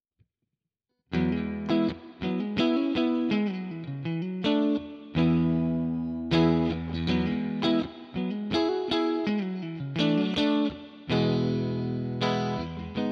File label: HSS Neck Mid Clean, electric guitars; Gusta GST 05 HSS; gst models